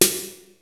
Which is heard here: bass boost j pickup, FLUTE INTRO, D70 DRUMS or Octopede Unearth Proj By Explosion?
D70 DRUMS